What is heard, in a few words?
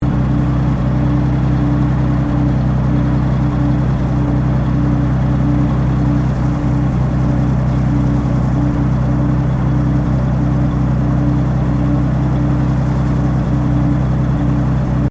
TANK CENTURION PRIMARY ENGINE IDLE EXHAUST, British Centurian main battle tank; Trucks; Vehicles; Foundation4